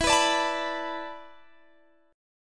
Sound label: E 7th Suspended 4th